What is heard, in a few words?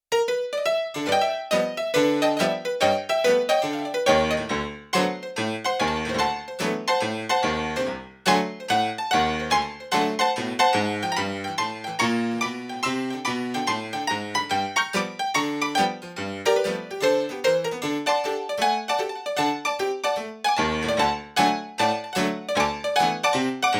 Yamaha CFIII Grand Piano; Yamaha TX16W; Rag Thyme